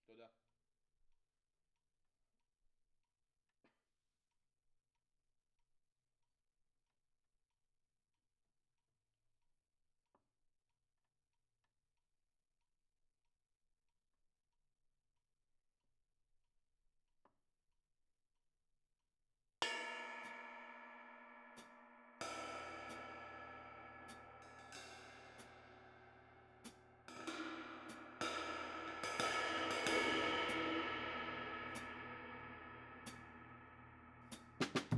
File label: Kit, 94bpm